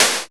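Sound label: snare drum; Best Service Gigapack 2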